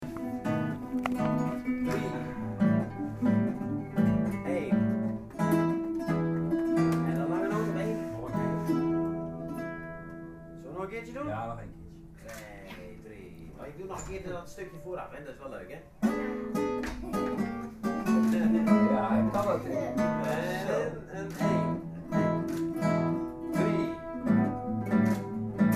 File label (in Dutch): gitaar, first blues; Muziek in de klas; Jill's first blues